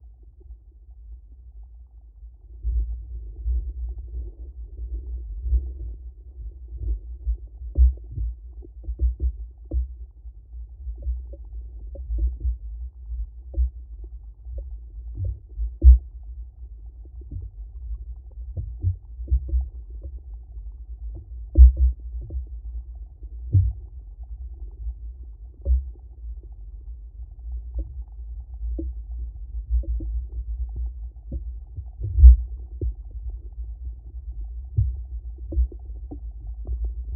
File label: hydraphone knock; TASCAM